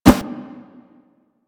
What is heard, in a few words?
Claw Whoosh; Warframe; Infested; Runner; Claw Attack